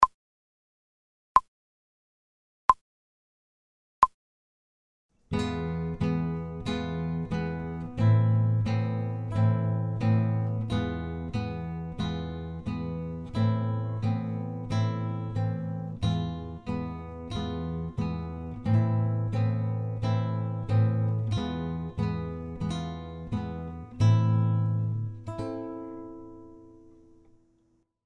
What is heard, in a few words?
slow, guitar; without metronome; Freedom